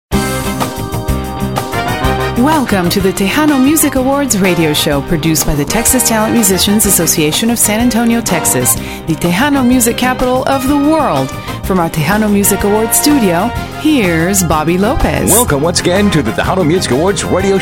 music awards show intro; texano

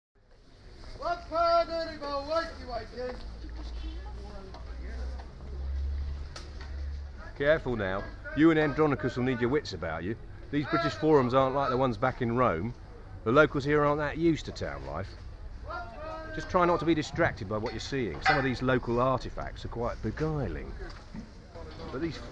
the roman market binaural

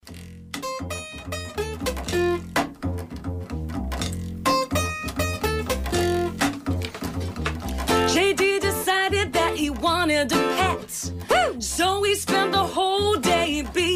bass birds beats

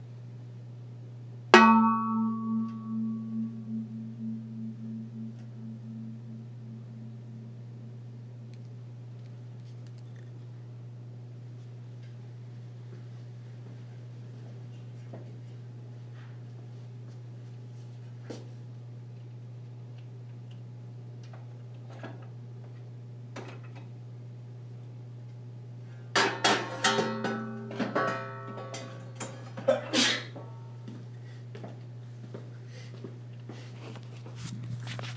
dust pan gong

